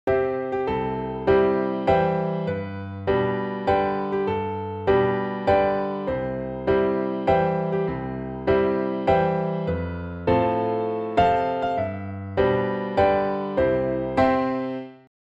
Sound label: Happy Birthday C Major accomp